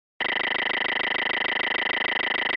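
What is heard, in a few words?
Computer Read Out Fast Repeat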